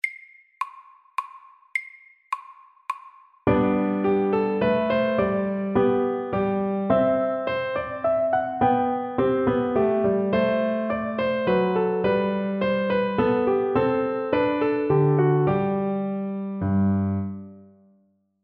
Menuett MIDDELS

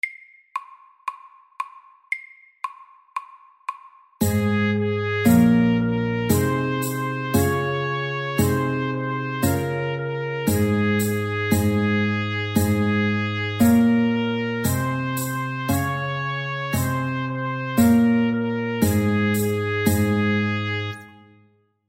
Folketone MIDDELS

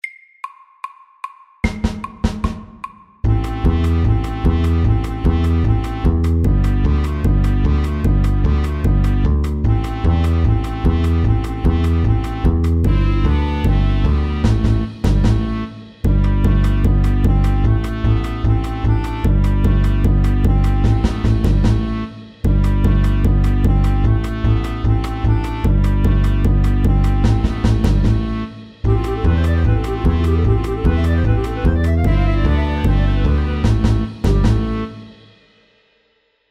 Balkan stomp FORT

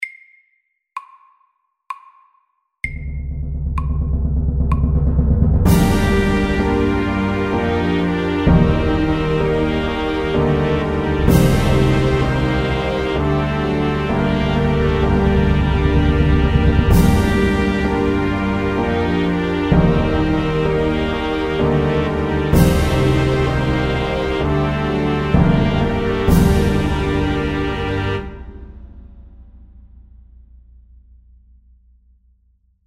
Filmmusikk SLOW 64

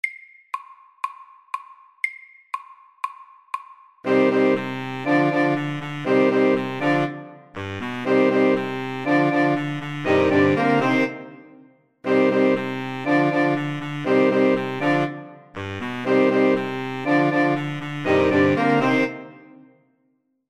Jam MIDDELS

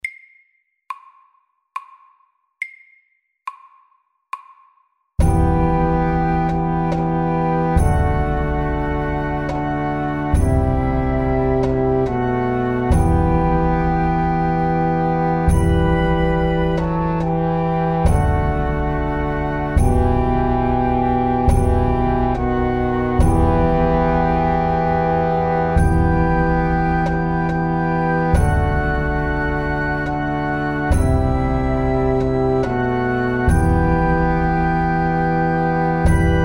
Hemiola SAKTE

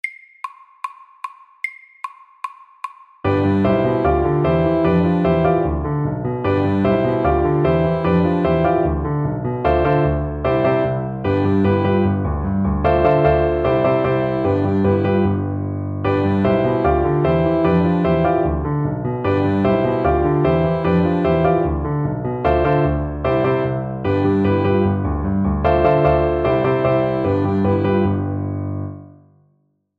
Honky tonk MIDDELS

